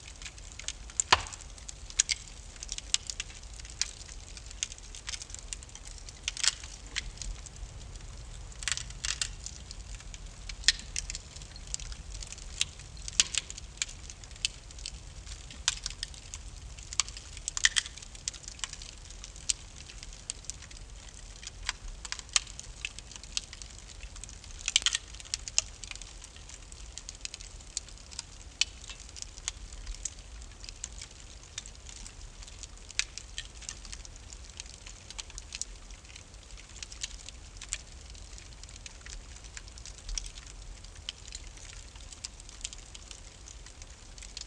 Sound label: Fire Kindling